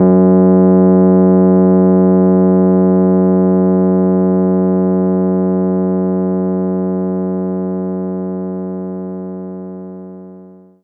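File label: Rhodes F#1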